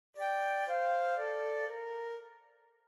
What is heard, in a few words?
flute; heroes of nerevelon